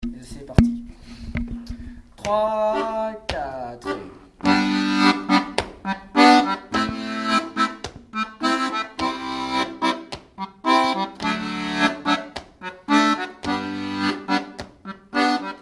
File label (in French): polka accompagnement; Polka ecossaise